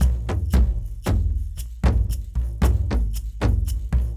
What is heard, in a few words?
Kendong, World Percussion; Brekete, Shaker